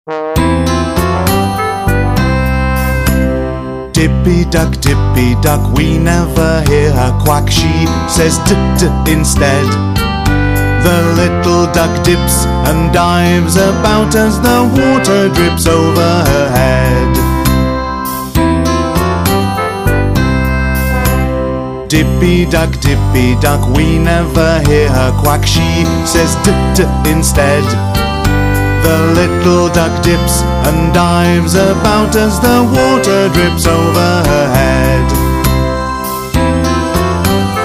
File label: Alphabet Song